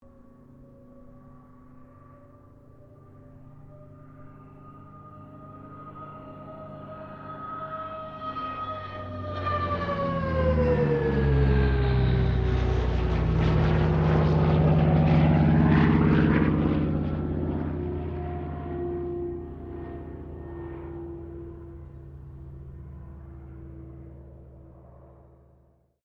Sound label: AIRCRAFT CA-13 BOOMERANG PASS STEREO